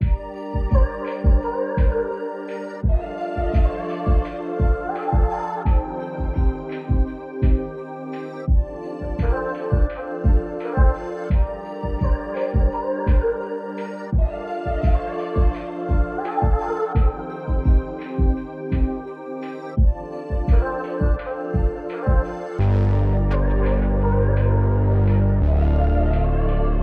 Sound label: 170 BPM G Min, RnB; All 4 U